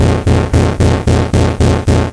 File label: bang Bang